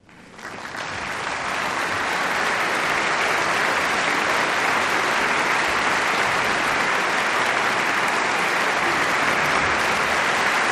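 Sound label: Applause Large Crowd Indoo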